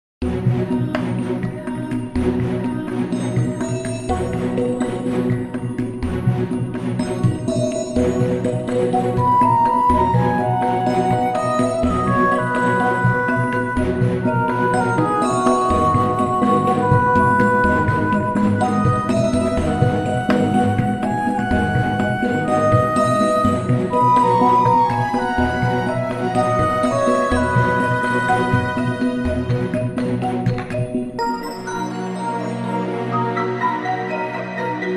Forest Fey, RPG Fairy Theme, Fantasy Background Music